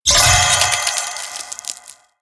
Skill Hit Frozen; Angry Birds Epic

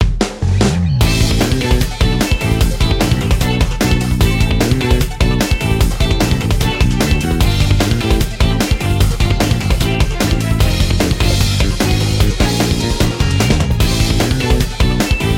arcade result; persona 4 arena ultimax

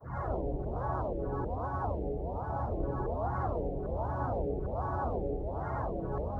Black Space B Ambient

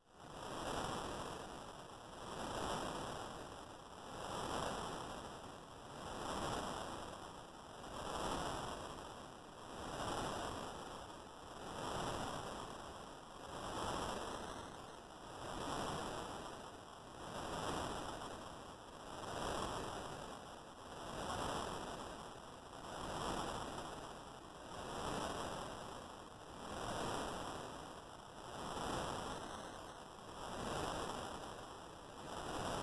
NOISE; rain world

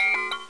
jangle

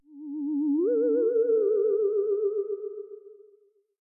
Meanwhile...; NightSky; 3DS

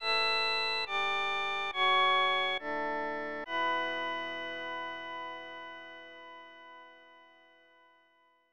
Transportation Fanfare; Bushi Seiryuden; SNES

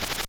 game closeup; Namco Museum Archives Vol. 1

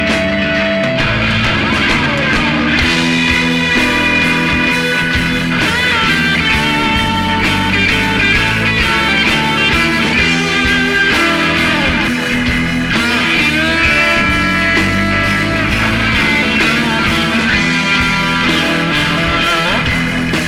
Mission Victory; Vietcong Soundtrack Collection

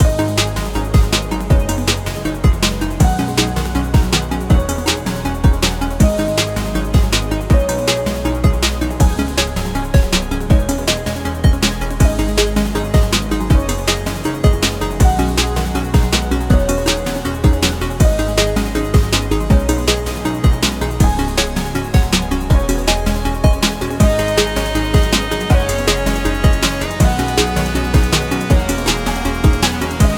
Music Ending 160bpm; Sockventure

